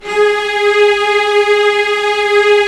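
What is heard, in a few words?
string section; Roland - LA Composer vol. 1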